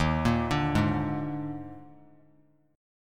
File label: Eb Major 7th Suspended 4th Eb; arpeggiated; 15,17,17,18; EbM7sus4